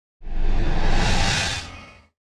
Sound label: Swipe